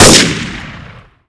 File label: scout fire; cstrike